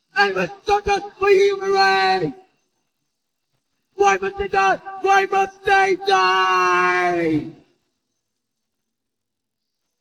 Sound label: vocals